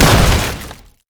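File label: minefield explosion; LoL; ziggs snowday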